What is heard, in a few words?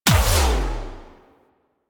Melee Heavy Attack Lift Impact; Warframe; Weapons; Whooshes; Heavy Attacks